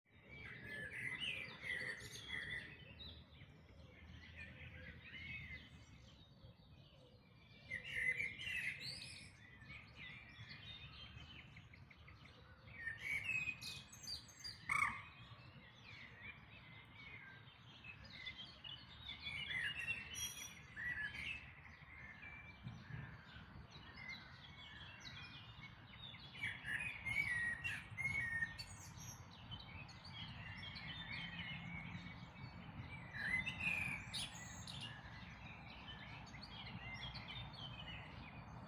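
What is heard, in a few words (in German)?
Dawn Chorus, Vogelgesänge vor Sonnenaufgang; der dawn chorus 2026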